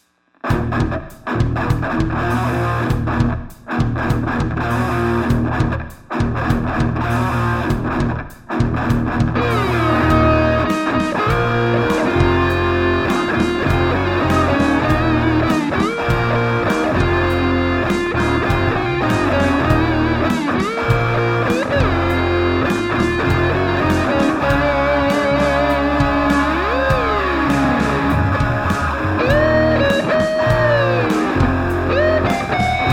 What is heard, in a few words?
fretless